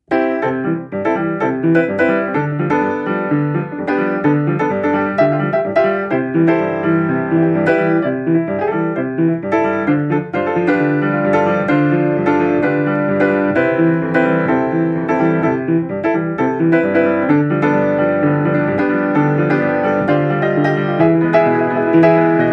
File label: Piano